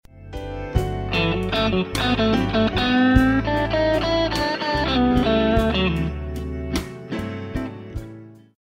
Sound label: Blues Lick, guitar